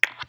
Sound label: button click